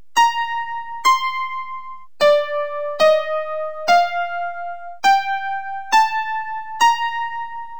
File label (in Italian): SCALA IN LA